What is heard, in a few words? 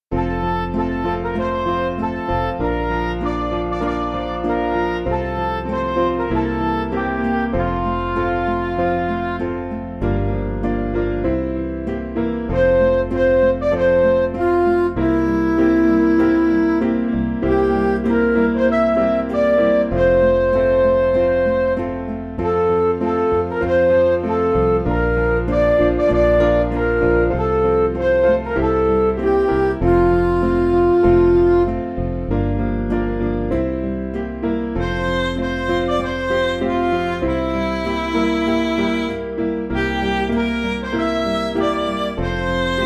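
Music Backing Files for Churches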